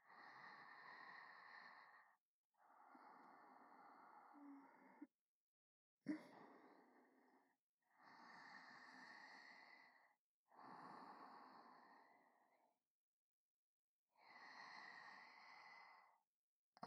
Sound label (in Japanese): 寝息ループ; ダウナー系仲居のゆまちゃんに温泉旅行中に色エロされちゃうバイノーラルwith わかな＆わかば